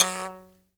Buzz Kalimba; Roland LCDP11 Africa VOL-1

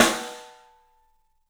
STEEL S, drums; 3D Sound

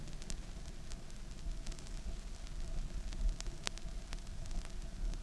Vinyl, noise

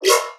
synth hit yoink factory, D#; Virtual Riot

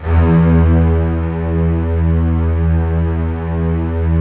STR CBS, Roland LA Composer; Roland - LA Composer vol. 4

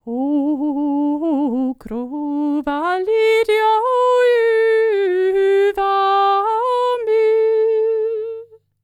Nord, World Voices; ILIO; Vocal Planet VOL-5

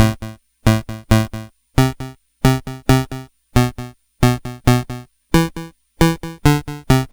Game Boy Bass FX; Nintendo Game Boy

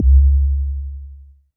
MKS80 BD; DANCE KIT

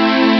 jump; ST-XX Amiga Soundtracker Sample Packs

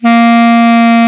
KLARINETT B, Alpine Volksmusik; Best Service

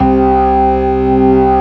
STOPS, organ combos

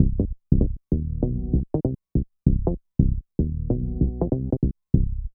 BASS LP; Phat & Phunky; East West

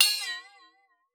WATER, PERC; Xsample VOL-9

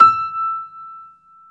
piano E5; AKAI S-Series CD-ROM Sound Library VOL-8

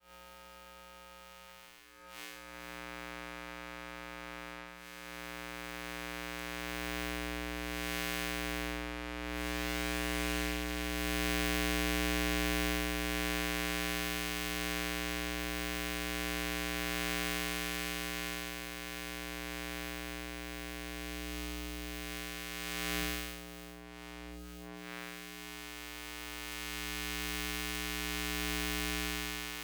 Electromagnetic Fields, Buzzin; electric fields

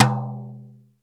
SLAP, BRUSH KITS; Crypton - DrumBase5000

